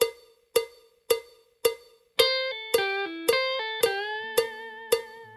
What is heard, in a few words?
blues tricks full, guitar